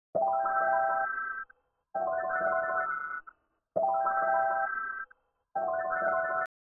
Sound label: 133 BPM E Min Texture Bells, loop stems; Cymatics; 2022 Melody Collection Platinum Expansion - Pt 1